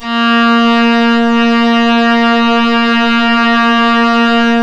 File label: Synclav FM STRINGS A#; Big Fish Audio